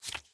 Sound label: Drop Card; Game rip; MiSide